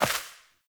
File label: Sparrow Death State Impact; Animals